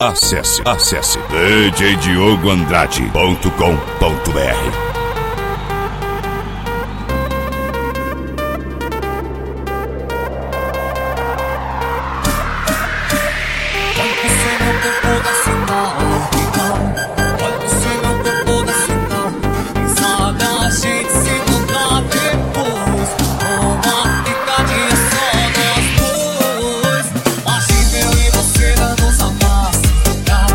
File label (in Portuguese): eletro funk